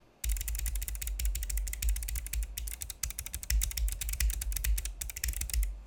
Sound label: keyboard